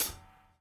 DOWN, DOWN KIT 78

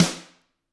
GRETSCH SNRR, Drums

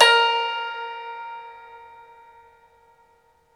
SU GZHENG, Earth Tones

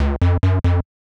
Sq Bass Riff Db F Gb, 140bpm; Zero-G Sound Sense Grime Scene; Construction Kits; Astoria

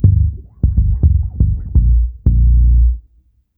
SNAIL FUNK G M, Bass, 76 BPM; Sampleheads; Ultra Freakin' Bass

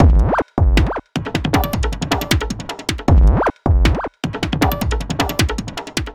SQUELCHY, 70-79 BPM; Spectrasonic Distorted Reality 2

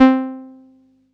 505, String Machines

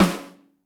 ROCK SNARE; Greytsounds VOL-1

